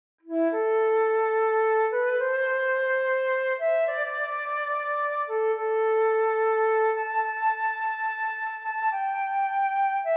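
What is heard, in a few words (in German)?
solo The House of the Rising Sun, Querflöte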